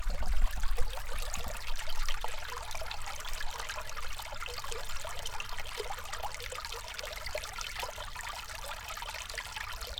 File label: water